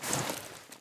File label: weapon movement walk; weapons